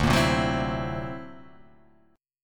D Augmented 9th down, guitar

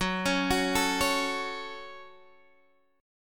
Gb Minor, Guitar, Open D; x,9,11,12,12,11; down; chord shape

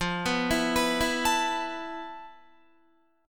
F Major 7th Flat 5th Guitar; arpeggiated

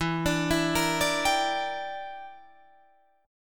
E Minor 7th Flat 5th E, Guitar Drop D

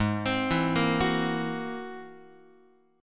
Ab Augmented 9th, Guitar; arpeggiated; x,4,3,2,2,5; Ab+9; JGuitar

